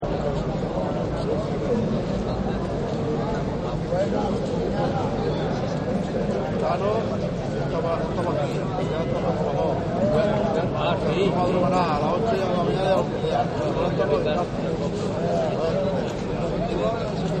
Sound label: Barcelona Market, Stores And Malls; Partners In Rhyme